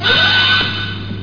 ALARM; Dark Forces

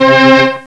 Orchestra; Atomic Structure 2; Chemistry

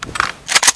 mp44 forward, weapons foley; Spearhead Demo; MacFormat 2003 June

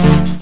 synth; The Epic Collection 3; Epic Marketing; muzak samples

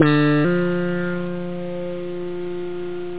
funky acustic, guitars samples

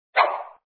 Swish

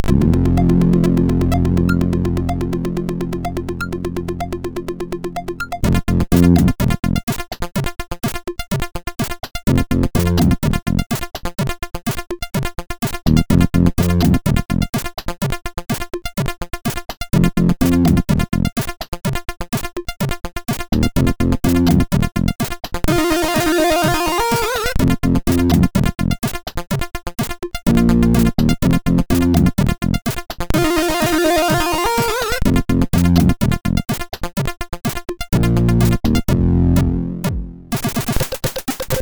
Atom Guitar

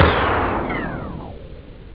stop; OctaMED SoundStudio V1; scratch

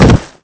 body fall dirt; Spearhead Demo

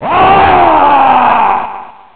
Growl; Gold Digger 1.2.1; Arcade

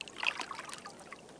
lap

